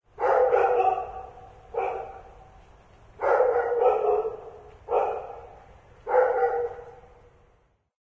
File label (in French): chien